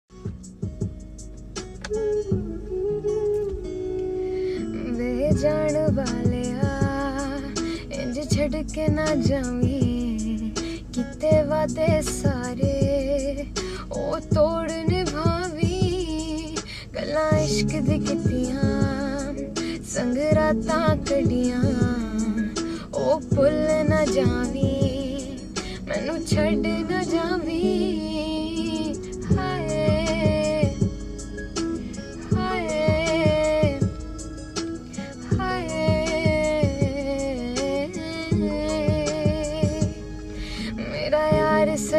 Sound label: Female Version